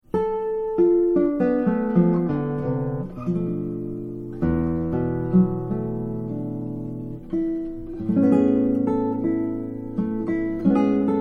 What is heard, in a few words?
Rainforest